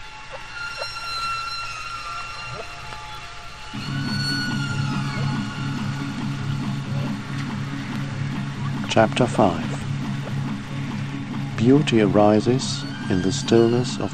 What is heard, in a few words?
dub